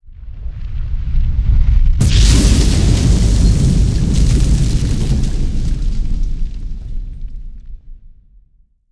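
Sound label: implode explode rock; astro; Earth and Beyond; EA Games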